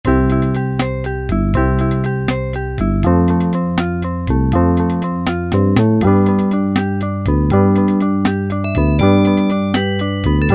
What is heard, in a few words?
ROCK-A-BALLAD, Yamaha SHS-10 Factory style demos